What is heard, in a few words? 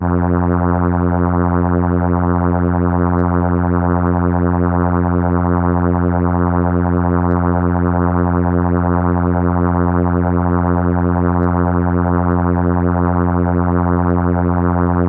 Horn F3, ARP Solina